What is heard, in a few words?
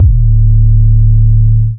Trap bass, EDM